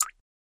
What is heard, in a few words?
Drip Sml Doink Classi